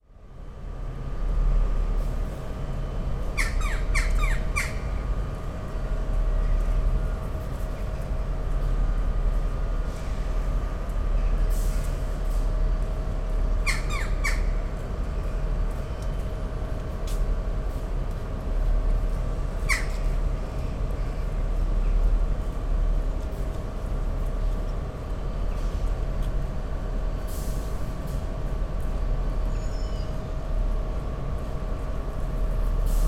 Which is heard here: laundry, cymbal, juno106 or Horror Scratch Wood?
laundry